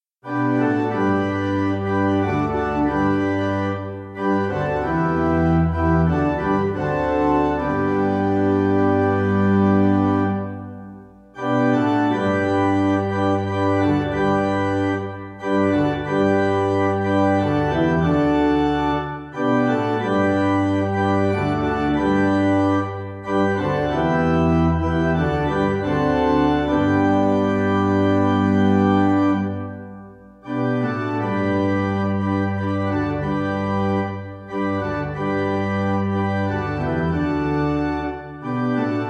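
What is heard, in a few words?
Pipe, Pipe Organ; O Father Show; Psalmist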